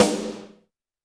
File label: Snare, Roland TD-20